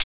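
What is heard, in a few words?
hi-hat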